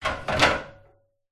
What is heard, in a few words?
industrial ladder metal hit movement rattle